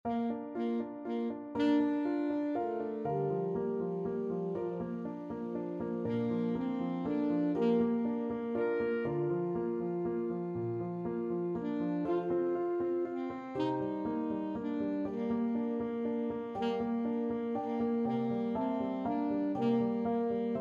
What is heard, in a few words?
saxophone